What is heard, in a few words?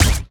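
Laser gun Single; Loki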